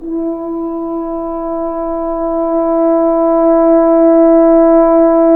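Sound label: brass, F.Horn Solo pp; Roland L-CD702; F.Horn 3 pp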